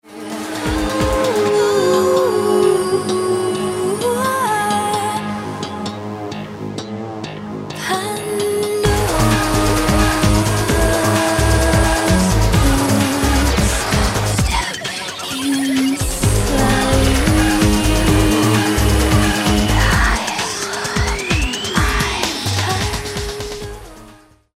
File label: distort